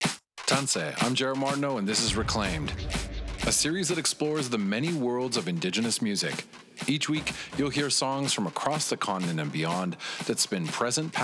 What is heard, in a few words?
Promo House Music